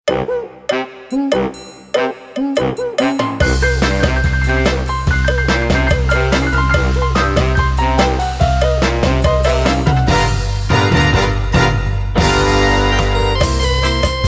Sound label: Lowrey A Go Go